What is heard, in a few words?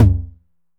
909 Tom, Drum Machines